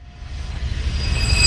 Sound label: riser